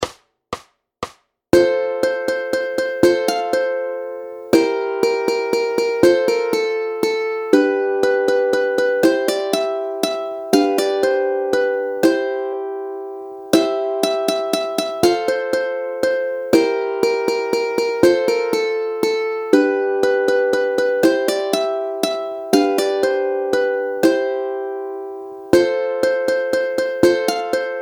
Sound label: Menuet, ukulele; Super Snadné Ukulele: Klasika pro samouky a začátečníky